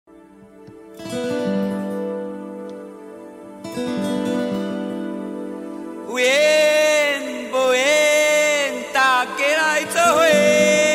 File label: Tea Leaf Picking Version; Kirin; Beer